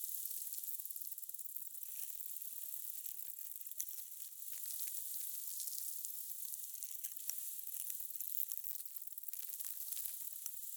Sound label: Exterior Wind Debris Insect Loop; Infested Eris; Warframe